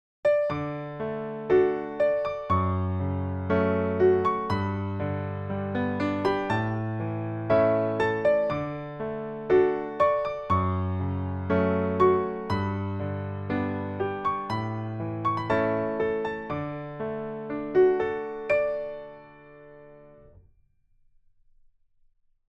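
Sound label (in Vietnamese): Nhạc nền video những kỷ niệm đẹp câu chuyện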